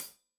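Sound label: hat closed, hi-hat cymbals stereo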